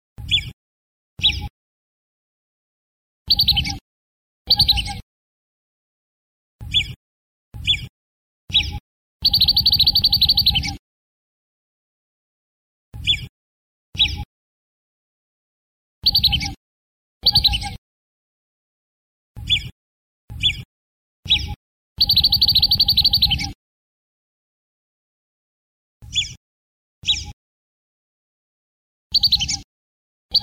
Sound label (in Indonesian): audio burung belibis kembang